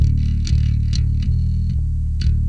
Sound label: bass